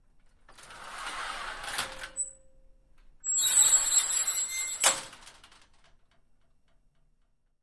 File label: Door Accordion Sliding Metal; Single; Open And Close 3x, Very Squeaky, Slow, Medium And Fast; Metal Gate